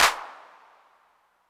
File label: Clap 808 Color, Hand Clap